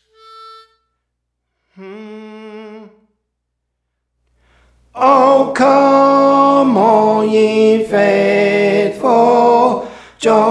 Tenor, Songs; Praise For The Lord Training; O Come All Ye Faithful

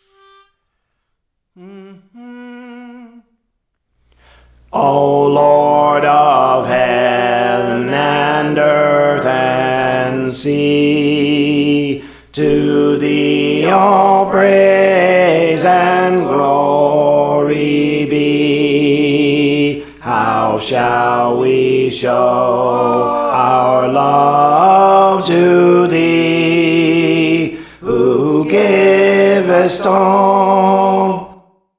Alto, Songs; Praise For The Lord Training

